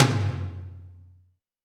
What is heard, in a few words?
Tom Lo Cannon, Alesis D4 drum machine